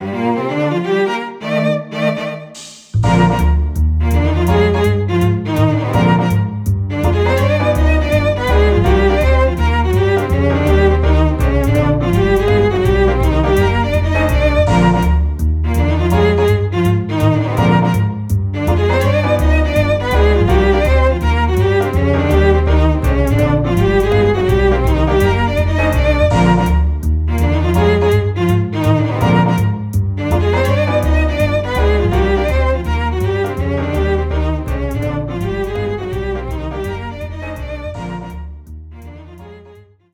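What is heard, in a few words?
BGM Salon Result Win Western; Clubhouse Games: 51 Worldwide Classics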